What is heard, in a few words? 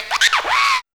SCRACH